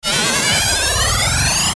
Ascend Static Spinner; Blastwave

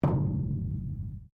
METAL IMPACT ECHOE; Metal Sound Effects; Metal (Sound Effects:Materials)

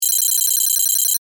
Data Readout Beeps, Scifi; Tech; BlastWave

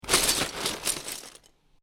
Lego Grab Handful Of Lego From Lego Box; Miscellaneous Toy Sound Effects; LynneMusic

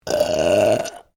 Burp female medium, Human Burping

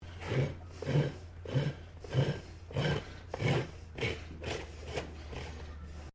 FARRIER FILING HORSE HOOF, Horse being shoed; Farm Sound Effects; Farms (Sound Effects:Backgrounds)

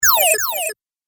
Arcade Game Magic Double Drop, Retro Inspired; video game; Video Game Sound Effects; Think Nintendo, Atari, C64

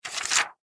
Fax Machine Remove Paper From Tray; Office; Fax Machines

